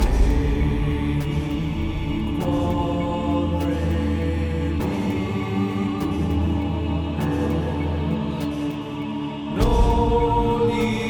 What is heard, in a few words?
Cathedral Chant